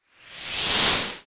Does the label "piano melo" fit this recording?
No